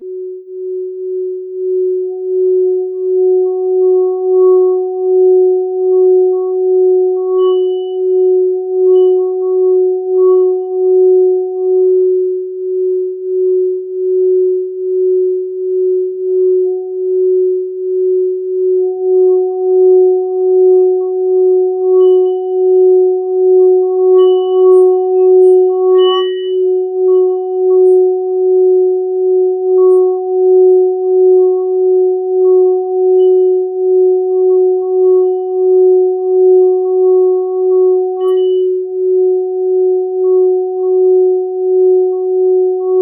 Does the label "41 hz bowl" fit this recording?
Yes